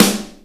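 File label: rock snare, Ensoniq ZR-76, Drum Machines; 300 Drum Machines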